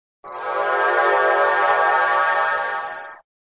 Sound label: Sound SFX, flipper; sttng